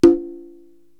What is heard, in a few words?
Conga Hi-Open; Korg TR-Rack